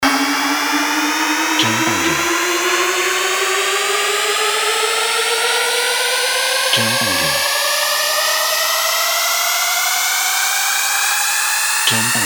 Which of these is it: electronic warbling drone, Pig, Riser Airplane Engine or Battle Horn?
Riser Airplane Engine